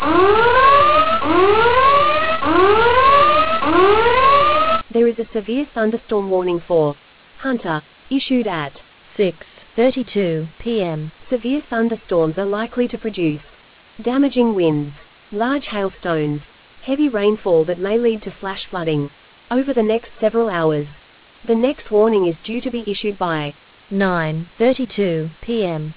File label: storm audio